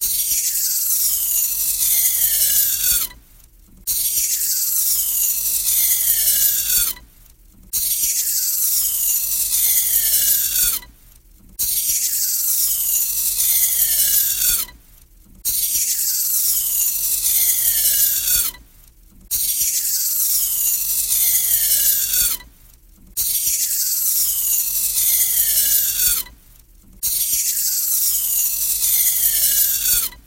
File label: Foam Alarm